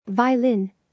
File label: violin